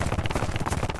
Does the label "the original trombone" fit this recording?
No